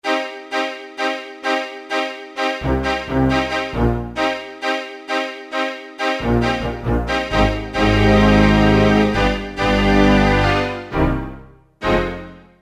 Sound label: Bowed Strg, Kawai K1m; Factory patches - I banks